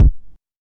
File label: bassy kick drum one shot b key; kick drum samples